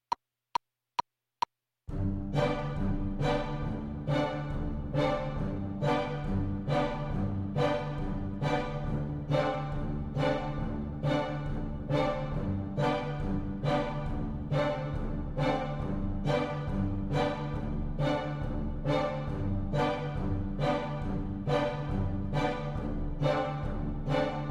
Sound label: Piano